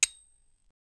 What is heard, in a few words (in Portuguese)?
picareta batendo no miner, mining